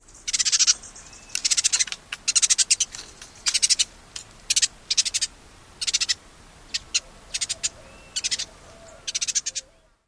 calls

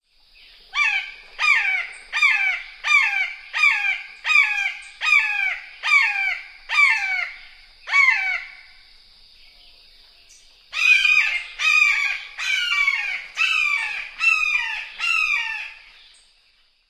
typical calls

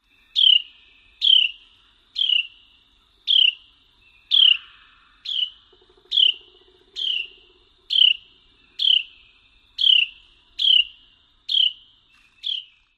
cheer calls

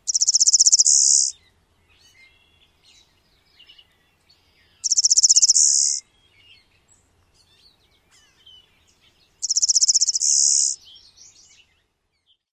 dawn song; NY

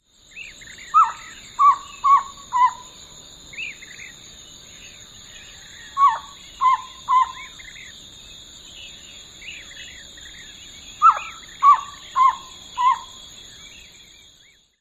call variant, Elf Owl

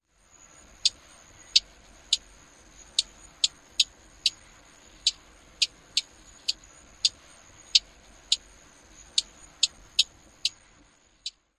SSTASP female calls

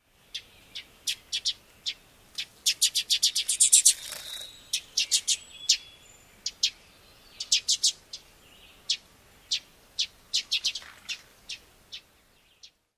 excited calling

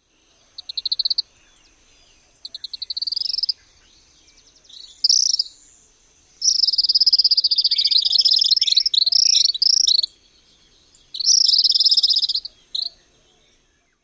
calls

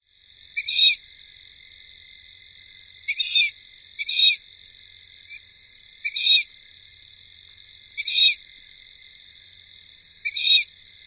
male calls; TX

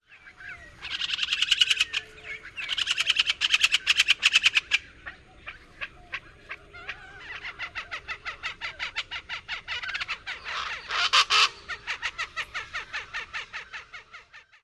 interaction calls

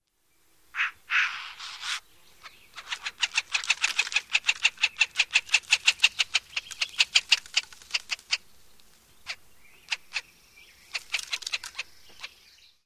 calls

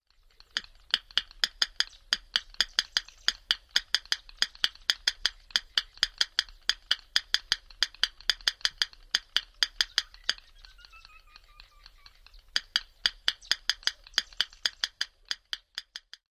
clicking of individual

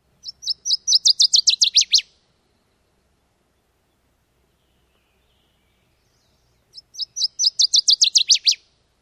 songs; NY

